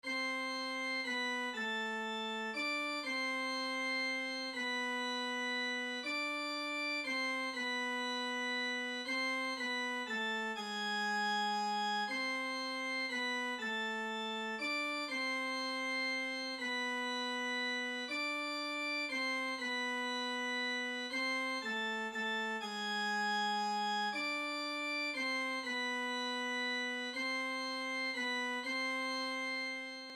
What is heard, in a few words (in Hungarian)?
szopran